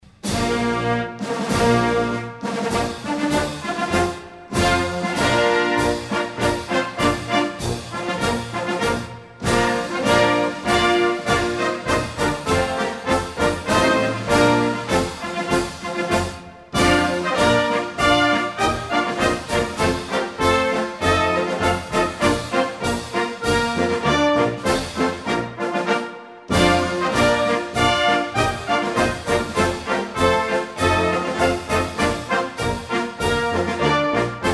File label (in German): Marsch